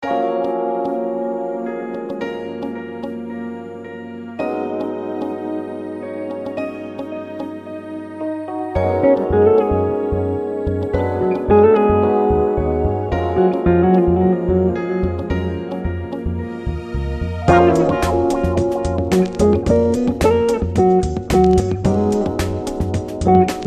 Ocean of joy GTR; Filmtv-tracks

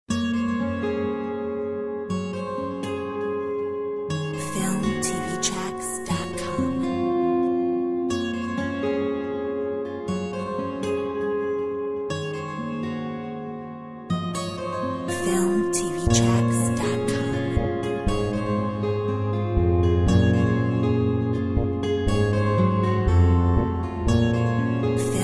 Acoustic Impressions; Filmtv-tracks

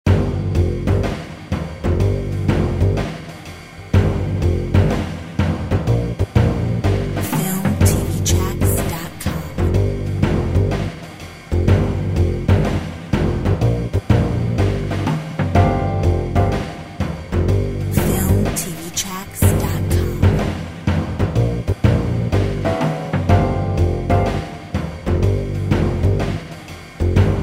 Big apple jazz

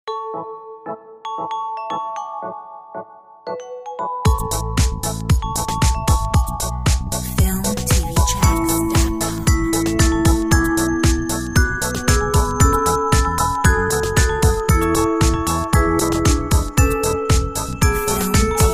Dance

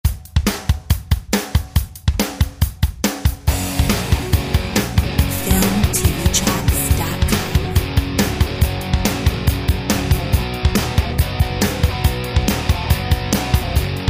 Blues Rocker

